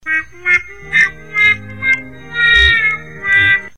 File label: horn louder